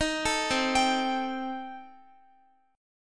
C Minor 6th Guitar, chord; Cm6 arpeggiated

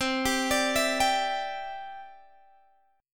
C-Major Add 9th, Guitar Open G

